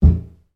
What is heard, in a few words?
household knock on metal bathtub